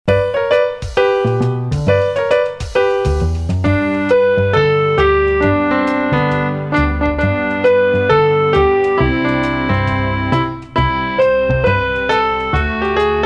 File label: jingle bells